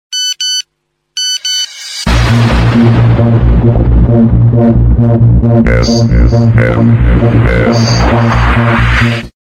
low pitched ringtone

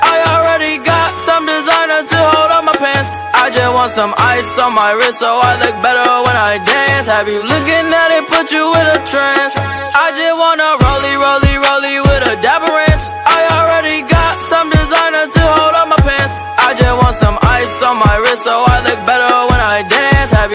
remix dj music ringtone

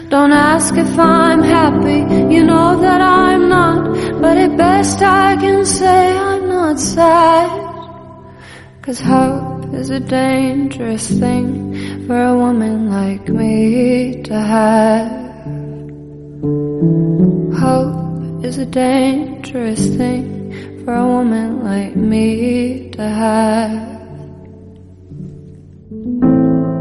romantic english song tone ringtone

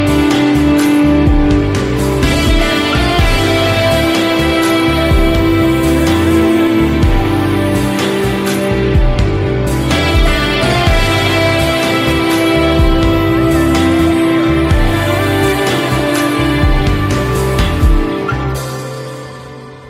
music ringtone; bollywood ringtones

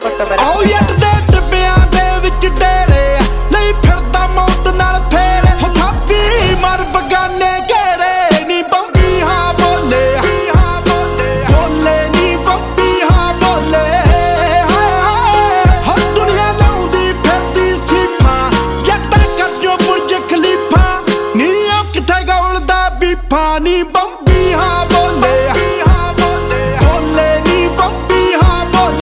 punjabi ringtone

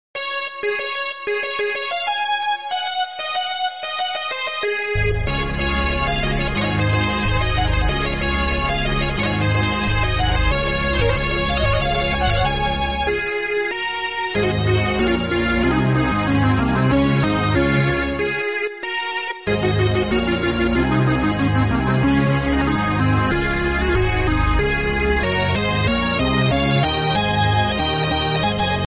trending remix mobile phone tone ringtone; samsung ringtones

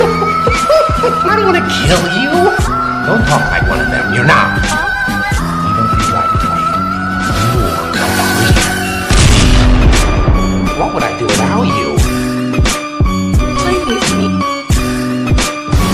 english music; english ringtones

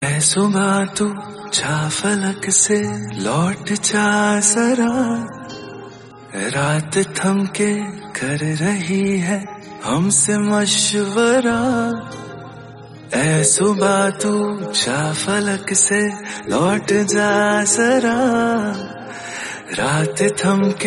bollywood song tone ringtone

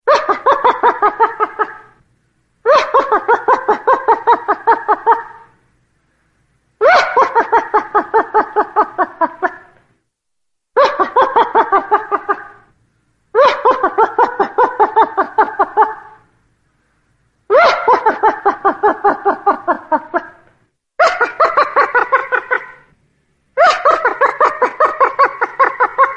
funny laugh background music tone

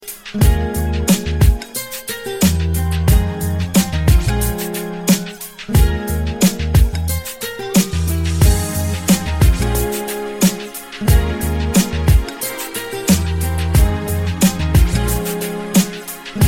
beat, ringtone; samsung

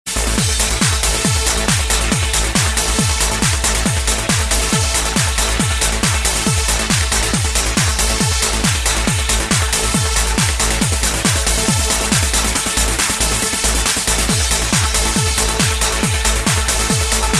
basic dawn tone ringtone nokia

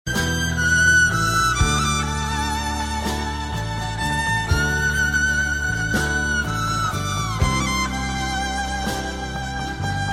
violin ringtone

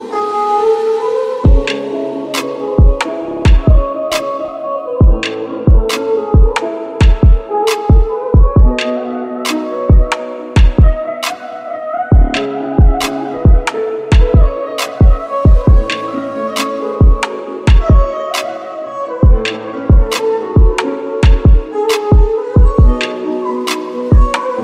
arabic background music tone

